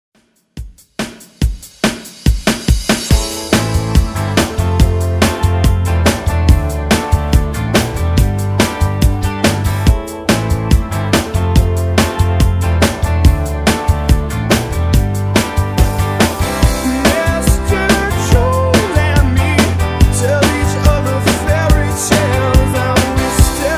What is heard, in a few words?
90s Pop Rock Redrum